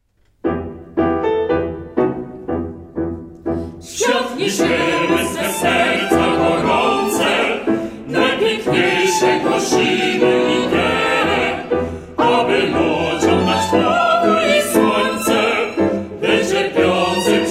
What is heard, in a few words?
hymn